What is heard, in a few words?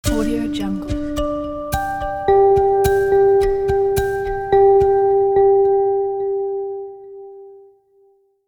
astral meditation bells